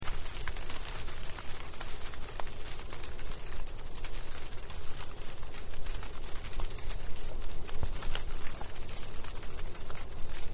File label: ginkgo leaf fall